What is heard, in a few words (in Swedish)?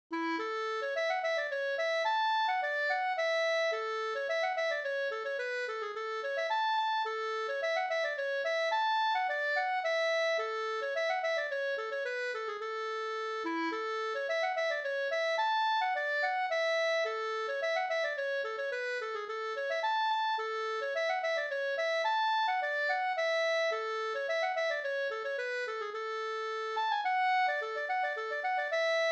polska Bingsjopolska Stamppolskan